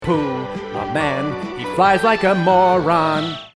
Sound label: Ringtone; Pumaman